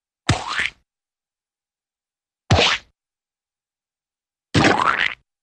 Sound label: Splat w light Impact; Hollywood Edge Cartoon Trax